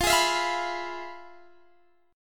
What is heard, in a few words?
F Minor Major 7th Flat 5th, guitar chord